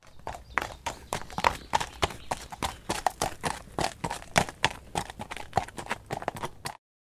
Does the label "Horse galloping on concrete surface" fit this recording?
Yes